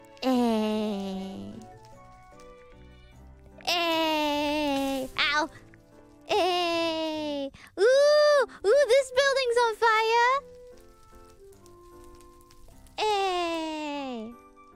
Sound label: eeeeeh